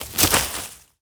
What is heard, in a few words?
weed fall; firefight